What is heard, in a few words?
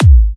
G#, kicks; markus hakala kicks